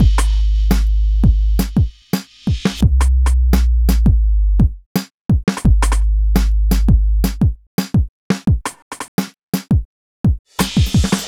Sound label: Beat, Hip-Hop